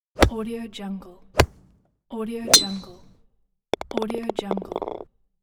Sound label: golf ball